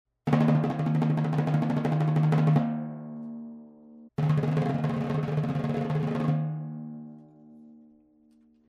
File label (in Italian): Rullo Timpano